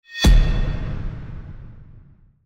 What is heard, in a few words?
Epic Metal Hit; soundscrate